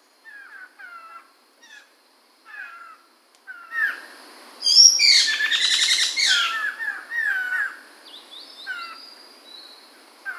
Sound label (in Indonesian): Suara Burung Poksay Mantel atau Medan